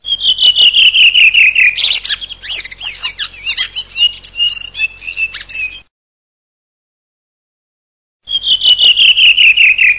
SASSELLO

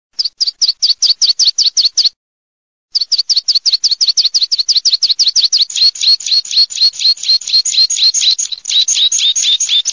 PISPOLA PRIMAVERA, birdsound

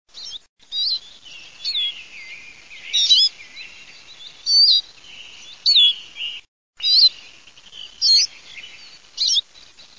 lucarino

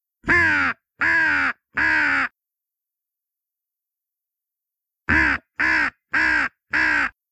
Cornacchie

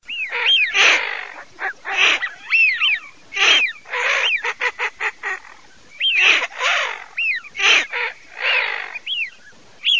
MORIGLIONE FISCHIONE

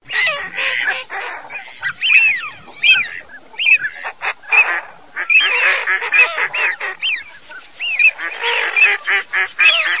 GERMANO GERMANI FISCHIONE

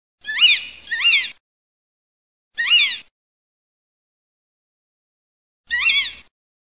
CIVETTA, birdsound

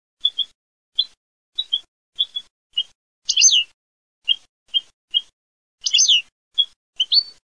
CARDEL

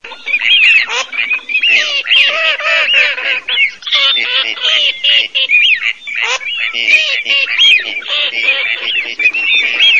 CANAP FISCHIO ALZAVOLA

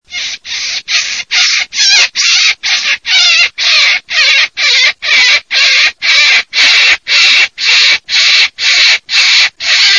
coniglio

